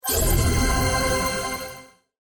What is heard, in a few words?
gem hit